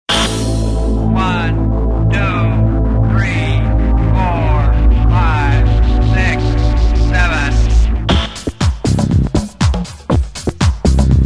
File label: flanger trance